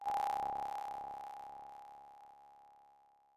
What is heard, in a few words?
E7 sharp 9 g